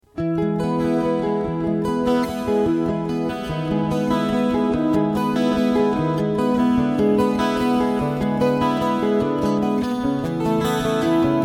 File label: guitar duo song